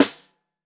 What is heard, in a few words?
SN Bottom, Snare